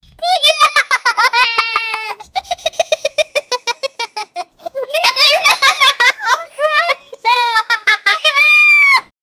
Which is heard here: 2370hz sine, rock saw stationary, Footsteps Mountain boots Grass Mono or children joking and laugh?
children joking and laugh